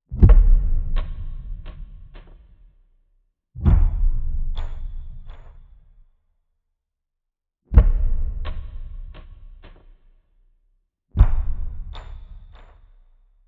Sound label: Throwing Dices Slowed Down